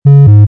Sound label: failure; session-android; redphone